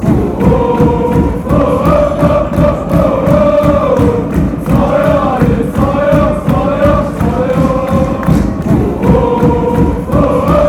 oh oh, chants

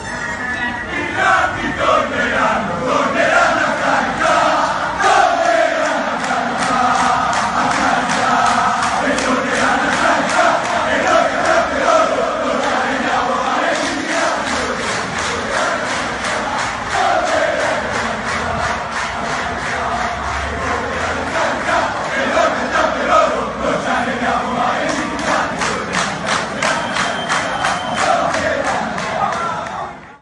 best pisa chants

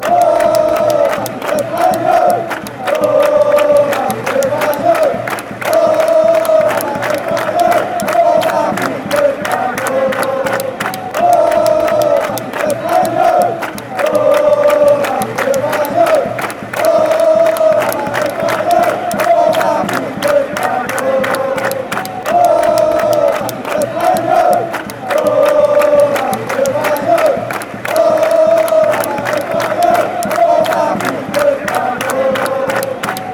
oh magico, chants; espanyol; The Barcelona club currently play in the Estadi Cornellà-El Prat

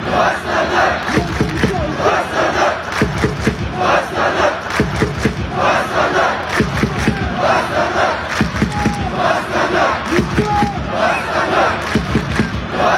astana, chants

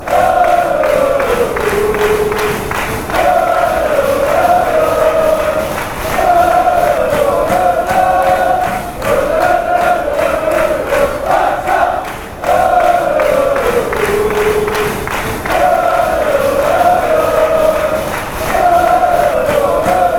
fan chants; lo lo barca

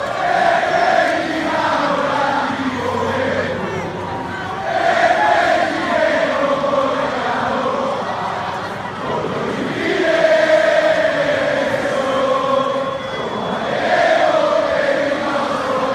best pisa chants, fan chants

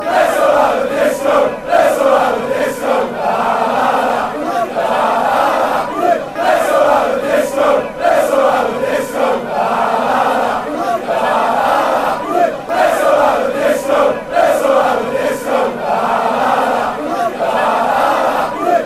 lets all have disco; hufc

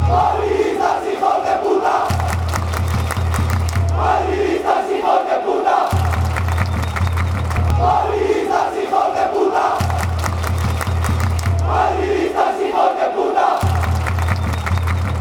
fan chants

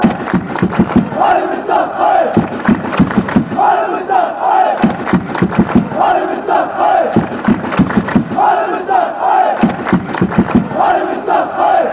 allez, fan chants

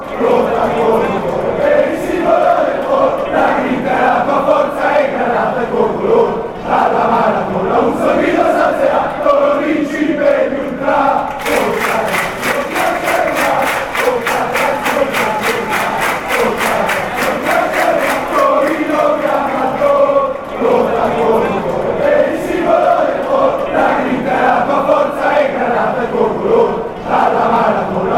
we wont stop, fan chants

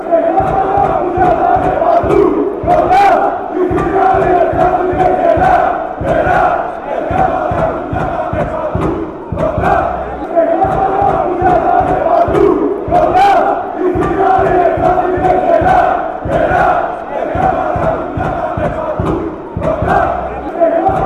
chant, fanchants; olympiacos

